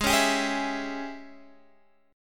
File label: Ab Major 7th Suspended 4th Sharp 5th, Guitar, DADGAD; down